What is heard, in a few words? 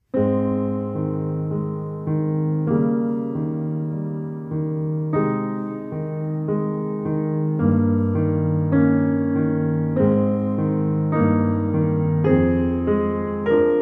adagio cantible, pianist